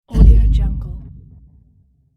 cinematic thud

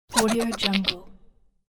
cartoon bad rat giggle; fxprosound

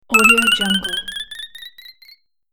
health drink game restore potion

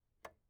light switch wall plastic flip on off

